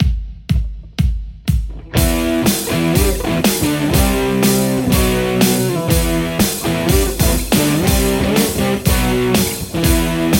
Les Paul; poulin; lextac

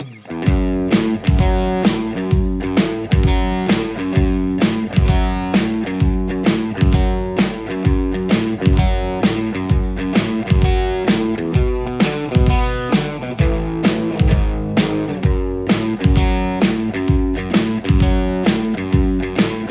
lespaul nrr1; igniteamps

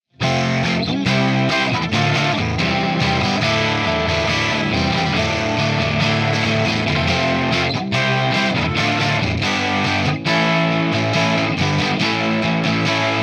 tube chords modern boost; ampsims; nickcrow

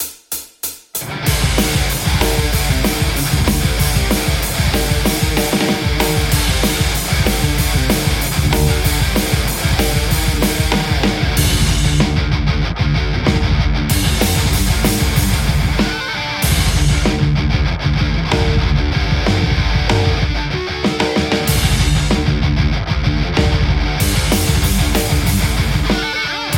metal tele fullmetal; overloud; th2

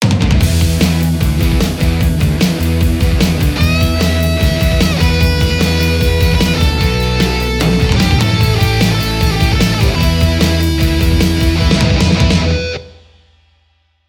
metal amp; softube; metal amp2

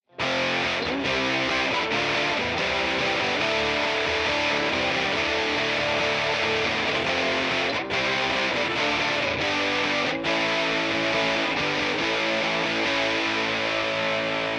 chords vintage; ampsims; tse r47

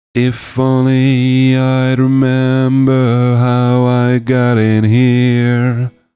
song no clipping

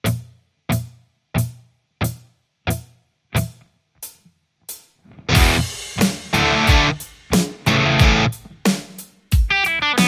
tele harlequin; mercuriall

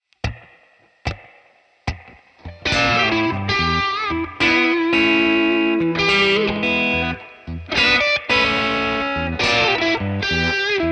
blues tele JCM800 hot; mercuriall

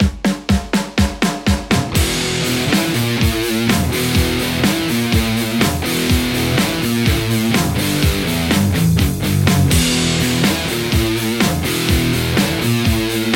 lespaul 8505; nickcrow

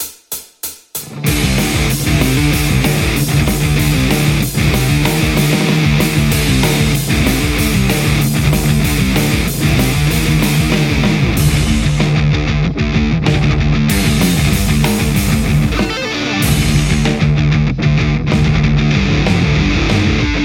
metal Les Paul; igniteamps

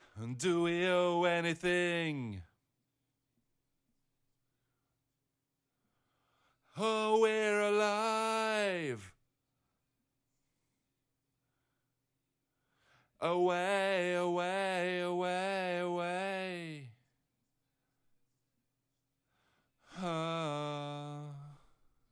vocals back

